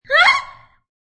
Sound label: High-pitched female laugh; Laughter; Voice